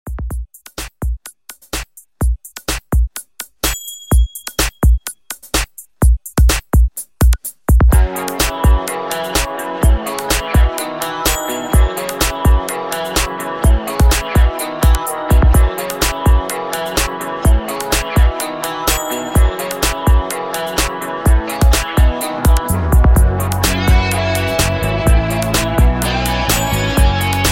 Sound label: 80s Funky Re Drum 126